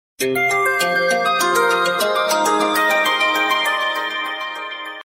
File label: samsung sms galaxy msg; ringtone